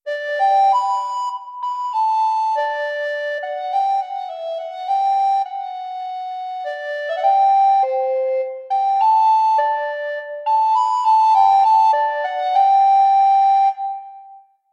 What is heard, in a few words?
Recorder; sibelius sounds